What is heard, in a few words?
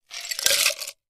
ice glass, glass clinks